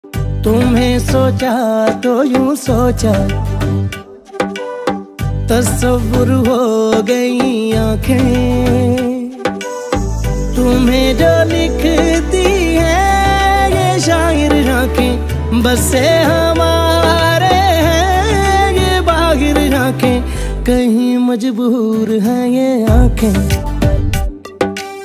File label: pakistani ringtone